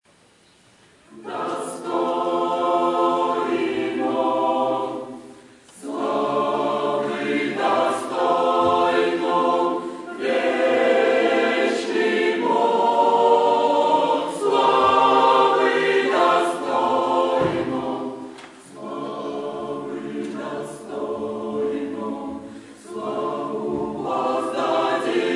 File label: choir